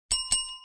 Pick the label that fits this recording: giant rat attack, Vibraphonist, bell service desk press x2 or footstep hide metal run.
bell service desk press x2